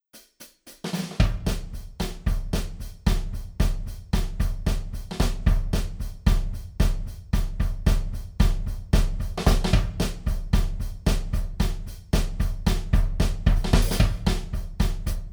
cutting room donut rod drums only; tascam

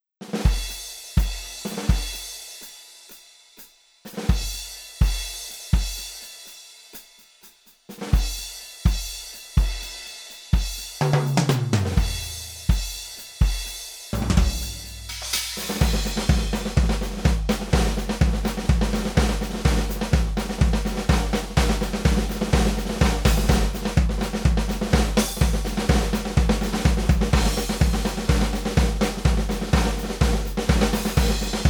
dry clean stick (drums only); tascam

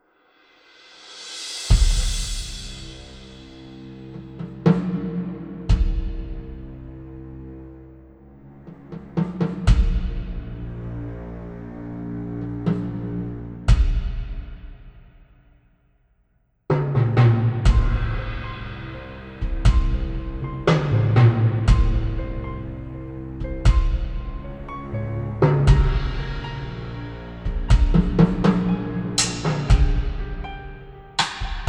aircraft hanger mallet; tascam

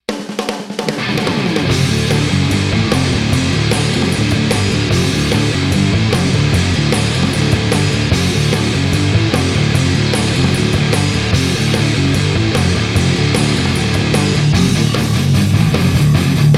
Heavy Drive

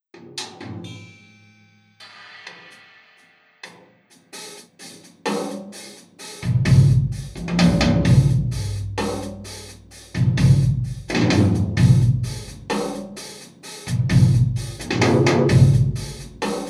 trash room trailer rod (drums only); tascam

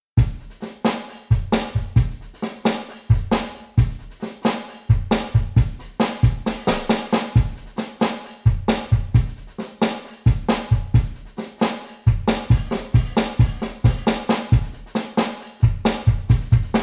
beach house stick (drums only); tascam

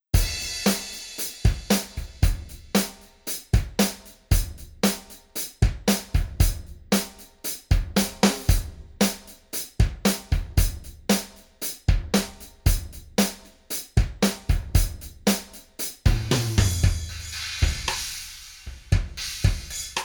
bright hall, drums only; tascam; craviota

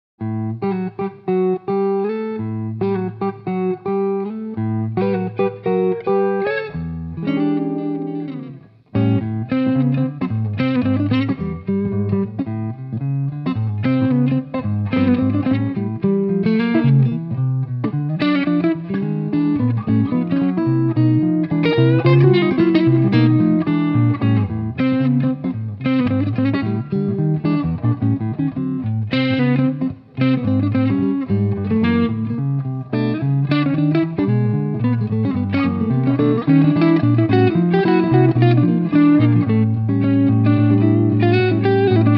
Swing Blues

